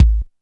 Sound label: BD, Dance Mega Drums, HIP